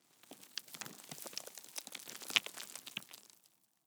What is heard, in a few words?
rockfall; ambient; Counter Strike Model Pack; CS:GO; dust2